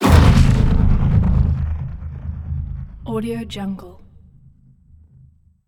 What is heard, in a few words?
attack defense muffled lazer disintegrati; robotic lifeforms 2